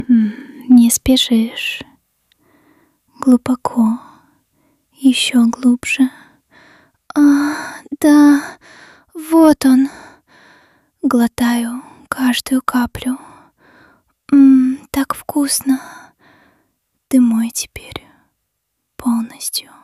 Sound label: swallow voice; test game